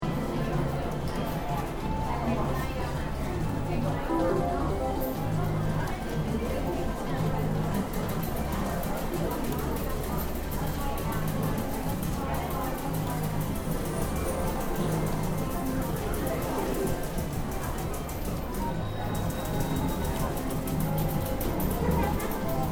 Ambience Casino Poker Machines Loop, Modern electronic poker machines; Casino Sound Effects; Foundation1